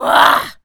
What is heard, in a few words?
attack, Zombies; zps; Z Waitress